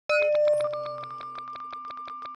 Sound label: points Skipped